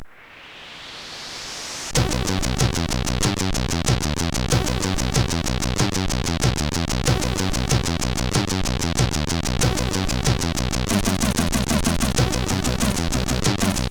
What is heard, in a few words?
Techno Chaos, sid CSG8580R5